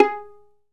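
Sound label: PIZZ VIOL, Solo Violin; E-MU Producer Series Vol. 2; More Studio Essentials; Composer; St. Arco Solo Violn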